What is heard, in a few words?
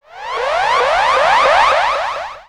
ALARM; Best Service; XXL Production Effects; ALARMS; Sample CDs